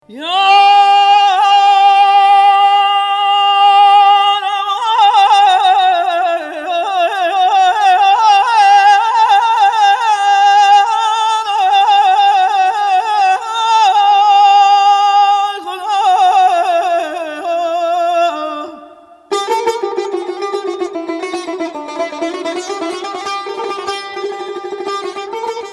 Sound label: Saz Va Avaze Daramade Aragh